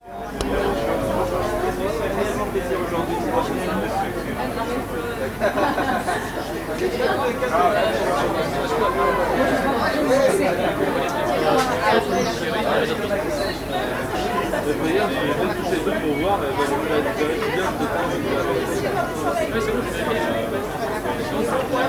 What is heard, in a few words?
soundscape